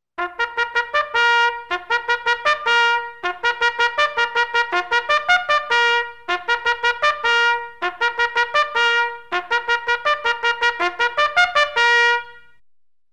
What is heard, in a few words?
Military Bugle Dismiss Call